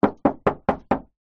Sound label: truck knock metal bonnet or panel x5